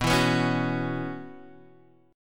B Suspended 2nd Suspended 4th, Guitar, Half Step Down; Bsus2sus4